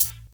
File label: 1990s raw hip-hop hat sound sample A key, Royality free hi-hat sample tuned to th